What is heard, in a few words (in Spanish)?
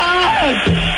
grito garras